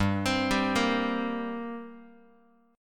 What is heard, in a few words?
G Minor Double Flat 5th G, Guitar, 4A, 4E, 5A, 5D, 5G sharp, 6C sharp; arpeggiated; x,3,3,5,2,x